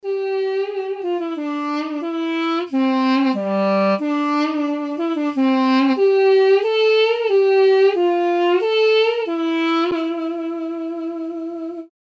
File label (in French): duduk; borodine